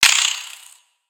Spider Get Hit

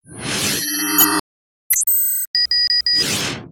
Hologram Zoom, ambience; Paradox; nexus tower

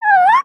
Kitty Cat Curious; creatures organic; Backpack

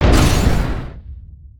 circle blast; creatures organic

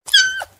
Kitten Meow; creatures organic

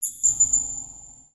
rats reverb; ambience ninjago

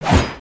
attack, creatures mechanical

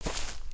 Footstep Grass; creatures pets

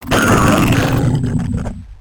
Spider Boss Scream; creatures mechanical

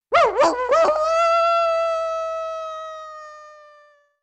Pet Terrier Howl; creatures pets

